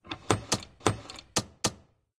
plotter; doctorwho1200

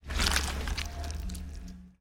telepathic off; p00gie

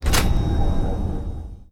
colored lever, tardis; p00gie

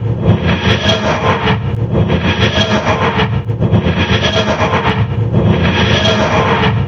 flight loop damaged, tardis; drmatt

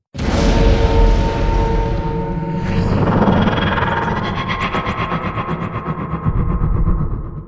damage big collision; jeredek; tardis

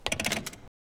lever; doctorwho1200

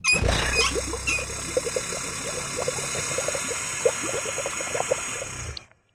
blue valves; doctorwho1200

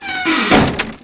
door close, tardis; drmatt

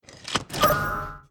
toggles off; p00gie

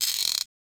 safe lock; doctorwho1200

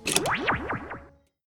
key, tardis; p00gie

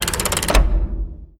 handbrake off, tardis; p00gie

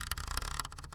rotary switch; doctorwho1200

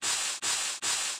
pump; doctorwho1200

